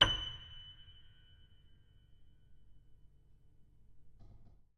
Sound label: F sharp 5, piano